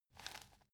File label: plastic bag hit soft